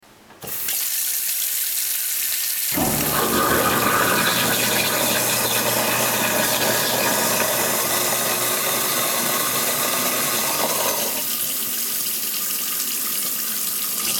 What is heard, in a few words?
garbage disposal